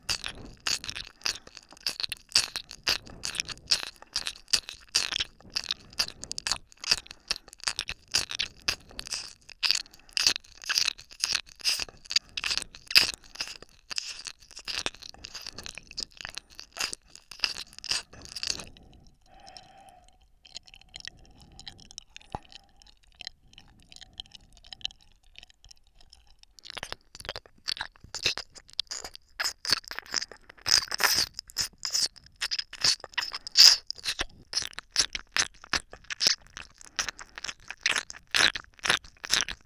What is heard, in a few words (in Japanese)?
左耳 深め